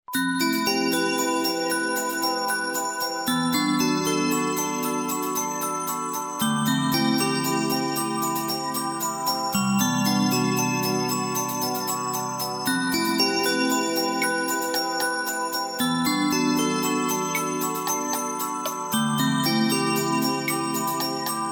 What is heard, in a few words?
MUSIC BOX